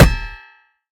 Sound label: hammer hit; Smite; Bellona